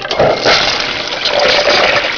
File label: Flush